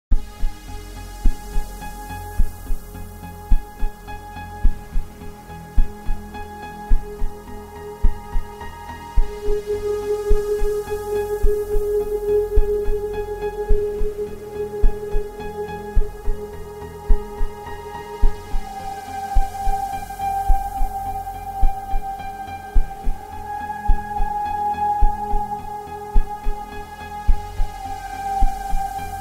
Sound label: Royalty Free Music